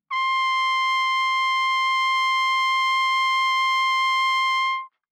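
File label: Trumpet vibrato ff C4 stereo, Bb Trumpet; MIS Pitches - 2014; Brass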